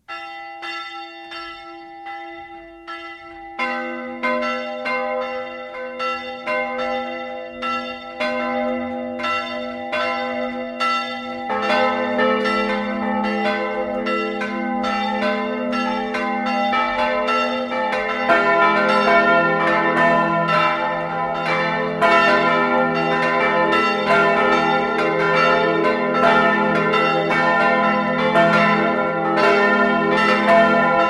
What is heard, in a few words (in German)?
glocken; zwischenhalt